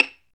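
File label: Violin pizzicato, Solo Violin FX; STR Violin FX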